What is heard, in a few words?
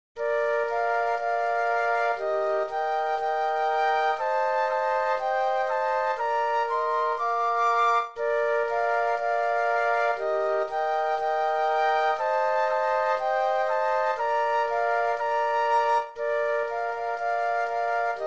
Little Brown Jug Flute Trio